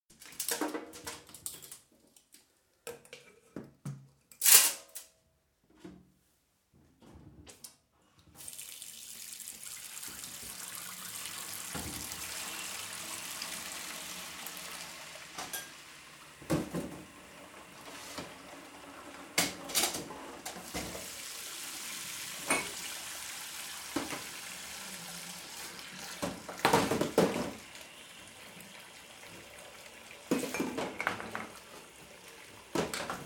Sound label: Washing Up; Bathroom; Household; BBC